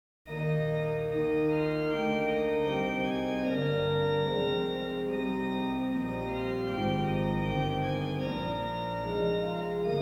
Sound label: Short Chorale Preludes; 8 Short Chorale Preludes; 4 Ye Watchers and Ye Holy Ones